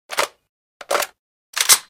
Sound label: m870 reload; fps3d